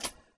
Schluessel steck; Vehicles; AI volvo 9900; Freyfurt

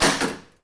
Entwerter, volvo 9900; Vehicles; AI volvo 9900; Freyfurt

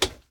wischerhebel, volvo 9900; Vehicles; AI volvo 9900; Freyfurt

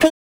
hupe an; volvo 9900; Vehicles; AI volvo 9900; Freyfurt Beta

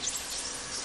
Fahrerfenster mov, volvo 9900; Vehicles; AI volvo 9900; Freyfurt

